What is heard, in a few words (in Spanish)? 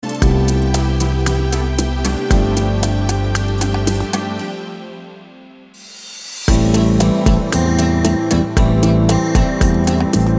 bachata